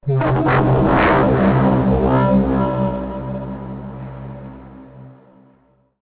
ringer; Ringtones

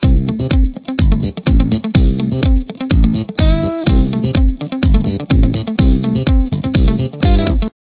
ringer; Ringtones

